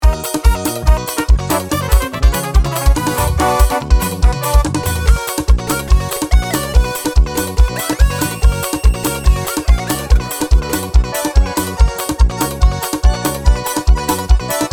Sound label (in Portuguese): flauta envolvente, Forró